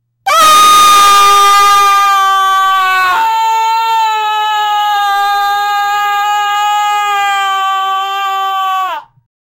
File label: short high pitch, scream; sound effect